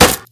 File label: wood box impact bullet; physics